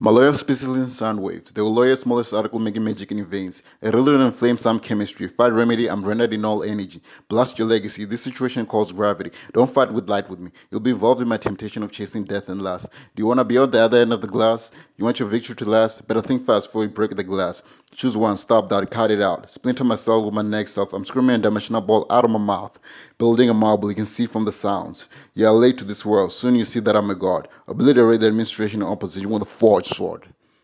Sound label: Proto Rap